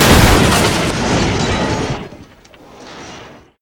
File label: train crash cube